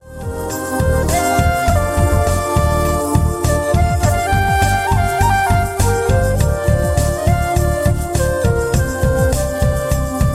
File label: instrumental ringtone